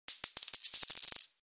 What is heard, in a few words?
Sound Message Alert